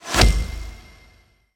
Arrow; Puzzle Chronicles; PS3; PSP; X360; SFX SPELLS

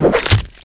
Wizard Pain

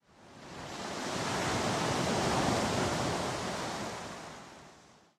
Amb Beach Waves

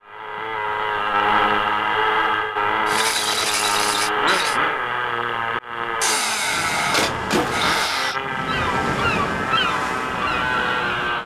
kart sounds; chibi robo photo finder

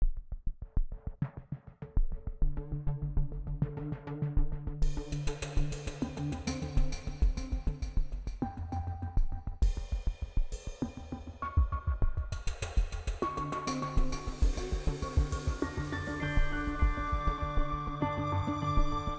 Melody Intro

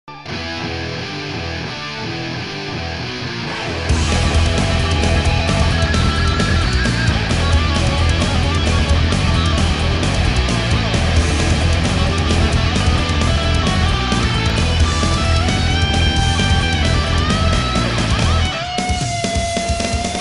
Intro Music; Motocross Madness

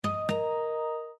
Notify

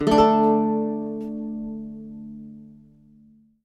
Lute Performance; Lute Anim

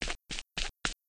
footsteps Cliff; gamerip; temple run

